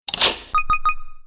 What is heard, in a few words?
buy upgrade; Ben 10 - Tomb Of Doom